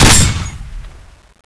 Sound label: nailgun fire